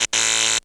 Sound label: Neon Flicker; zps; Ambient